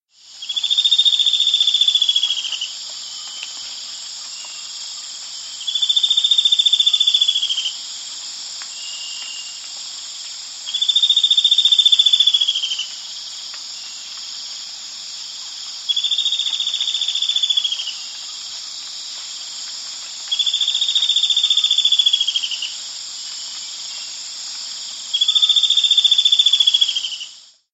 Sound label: Spot-Winged Antbird; S. America